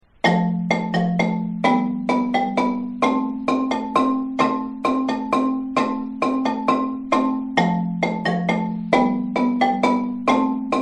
pre chorus, marimba

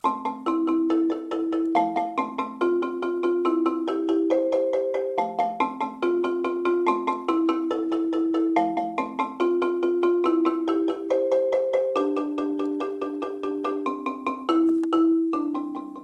marimba

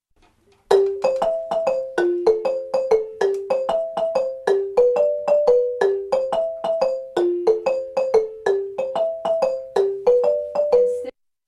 soprano, marimba; first soprano; variation 2; babamudiki

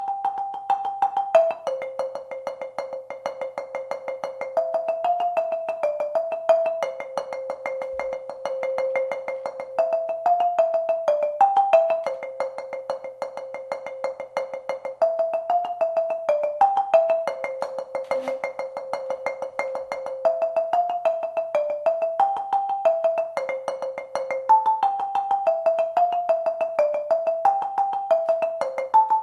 lead high rolling, marimba

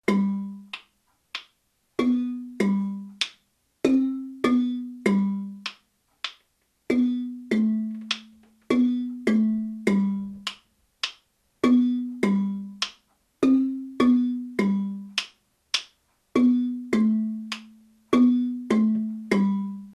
baritone main, marimba; kare mugomba

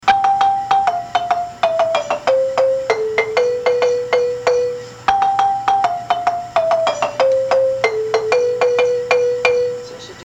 lead high, marimba; manhanga

